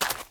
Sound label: gravel walk